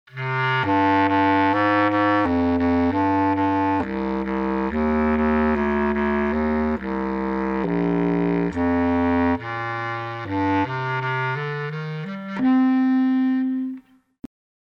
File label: bass clarinet